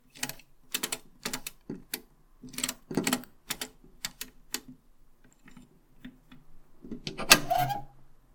mail box, マンション郵便受開

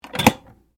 close rice cooker, 空炊飯ジャー閉

